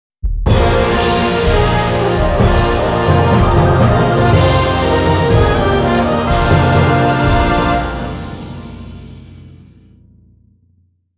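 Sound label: Opening brass version; the tower of druaga the recovery of babylim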